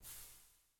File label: Footstep Leaf; Harmony Hermit